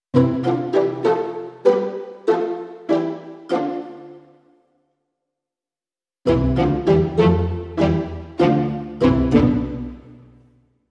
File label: Traditional Percussion No Leads